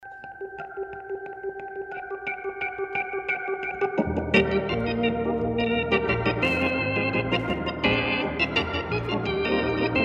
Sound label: Jingle Bells; This Time Between On Christmas Eve program